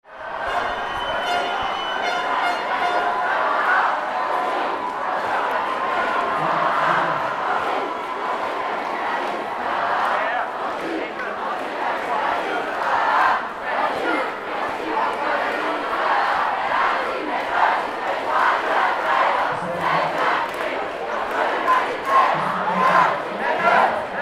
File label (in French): Aaah AH